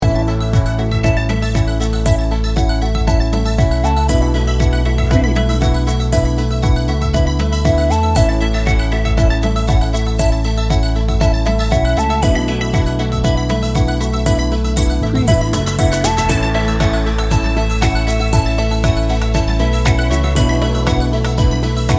motivating and inspirational 30 second, electronic percussion